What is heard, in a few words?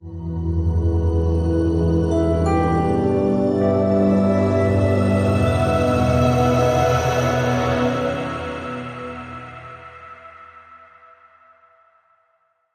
Sonic Universe